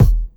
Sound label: ALA BD; ALAKIT; USB Soundscan vol.31; Miro s Grooves; AKAI